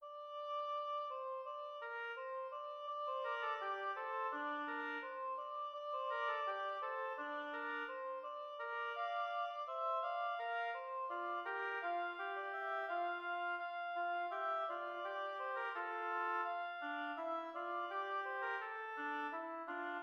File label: oboe duet; josquin